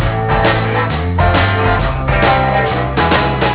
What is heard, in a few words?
music